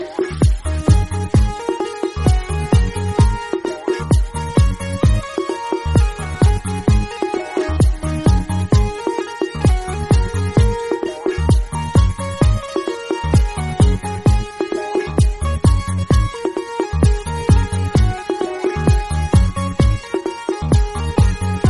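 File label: Romantic background music, ringtone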